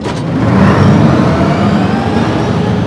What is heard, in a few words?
apc start; gdi